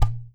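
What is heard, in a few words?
IPU GOURD, HAWAII PERC; AKAI S6000 CD-ROM; WorldSounds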